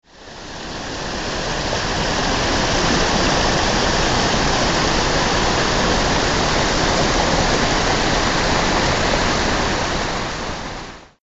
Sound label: brook; NC; 2010